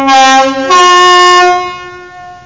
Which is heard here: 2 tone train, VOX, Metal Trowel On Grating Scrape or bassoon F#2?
2 tone train